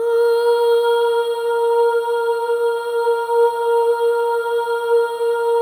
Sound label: SOLO BOY, AH; Spectrasonics; Symphony of Voices